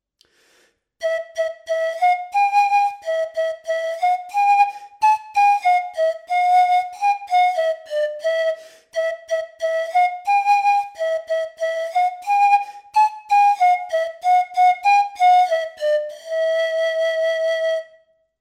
frauta de pan

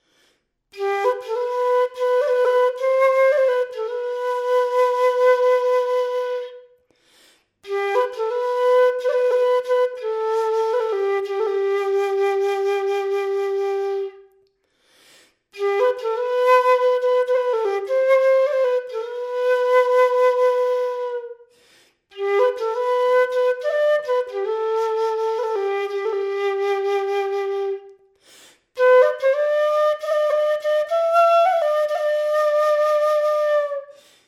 frauta

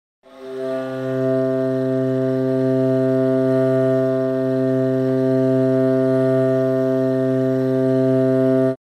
bordon

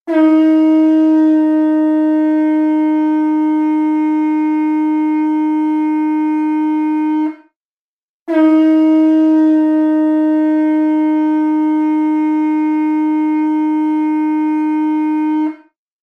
bucina

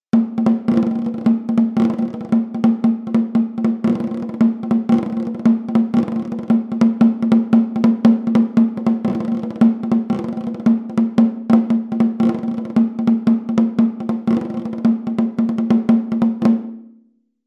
tamboril

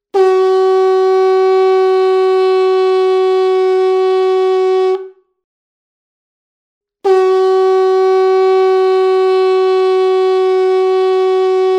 corno

